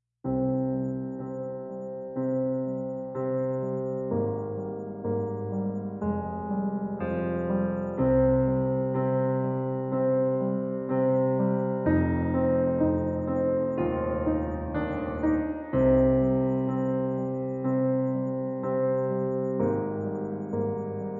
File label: Piano Only; Gothic Storm Music